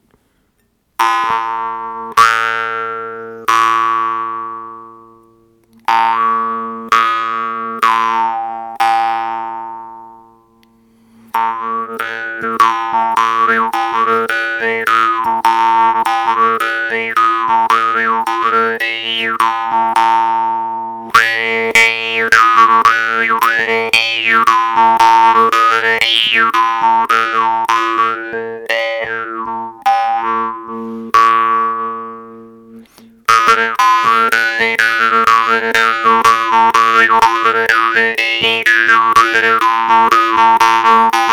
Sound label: drymba